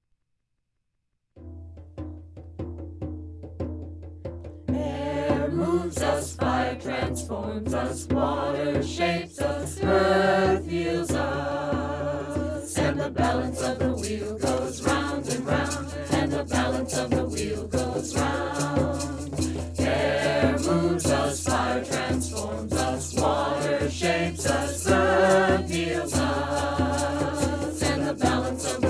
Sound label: Chants